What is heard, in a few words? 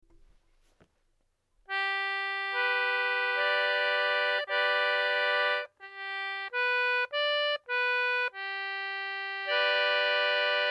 chord; timbre comparison